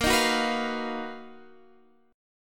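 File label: B Major Sharp 11th, B flat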